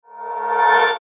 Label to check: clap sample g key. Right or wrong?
Wrong